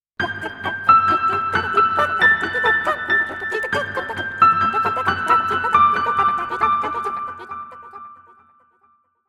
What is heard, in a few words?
Sound Logo